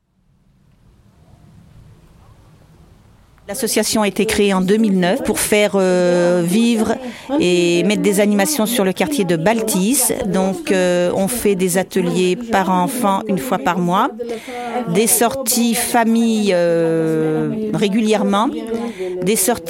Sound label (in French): farandole quartier, ambiance; LesGensDeVoiron; Brunetiere